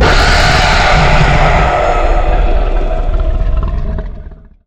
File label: rage; dota; lifestealer